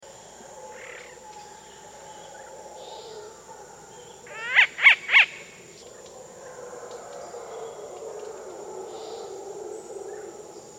Sungrebe; S. America

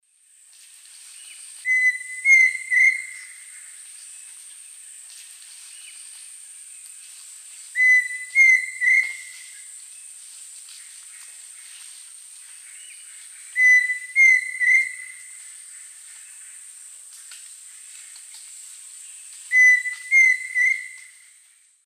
Dromococcyx phasianellus, song; S. America; Eastern Lowlands

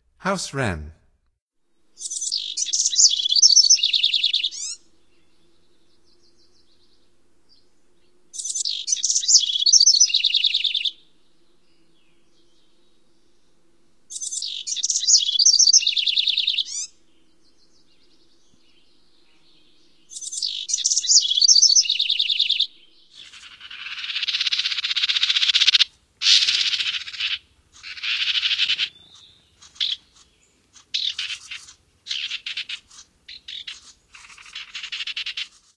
House Wren; North America; Stokes

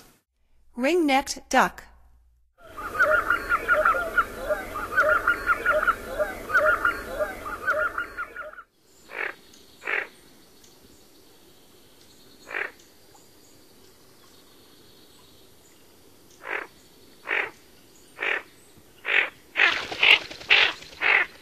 Ring necked Duck; North America; Stokes

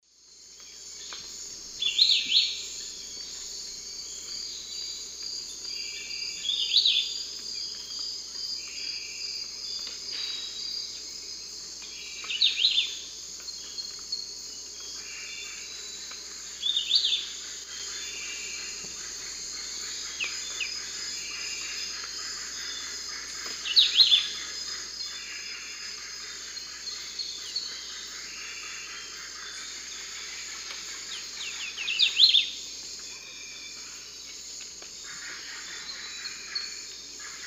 Hylophilus hypoxanthus, song; S. America; Eastern Lowlands

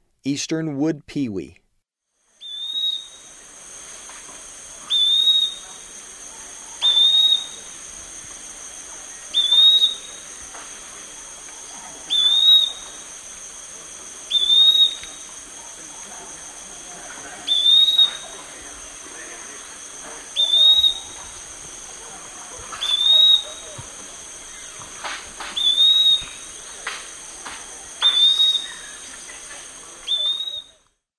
Eastern Wood-Pewee; S. America; Eastern Lowlands

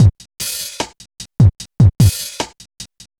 hiphop

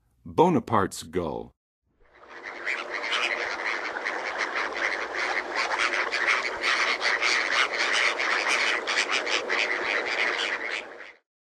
Bonapartes Gull, Western USA Songbirds; North America

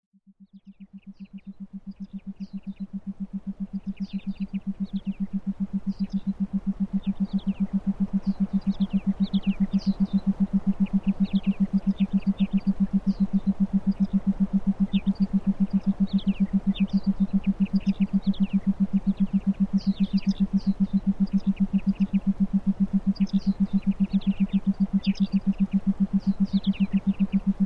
Binaural Beats Theta Meditation Forest

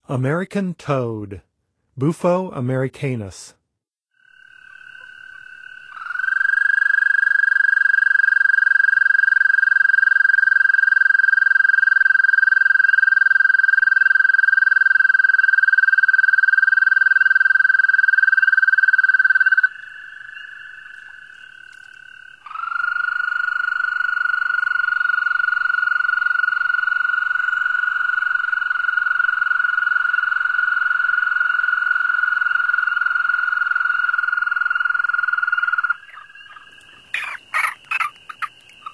American Toad; North America; Songs of Frogs & Toads of North America

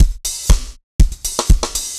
dance floor tight 120, loops

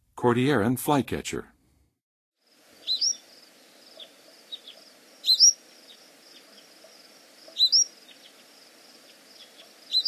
Cordilleran Flycatcher, Songs of Birds; North America; Western USA; Western USA Songbirds

